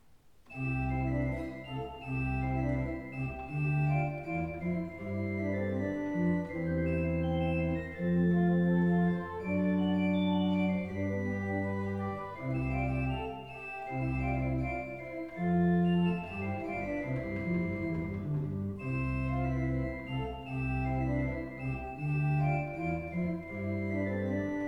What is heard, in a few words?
Trio C-dur, orgel; orgelkonsert; Trio 1 C-dur; Sorge